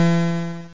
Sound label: new bonus; PlayPac